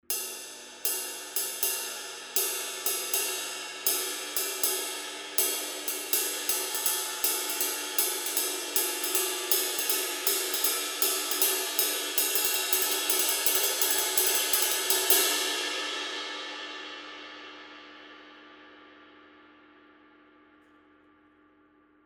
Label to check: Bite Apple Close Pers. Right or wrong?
Wrong